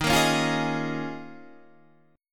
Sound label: Eb 7th Suspended 2nd Suspended 4th Eb, Guitar Open D